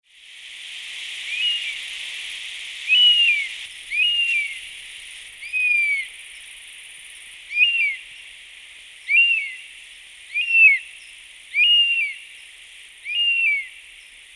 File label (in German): Wespenbussard Flugrufe; Tembrock Guenter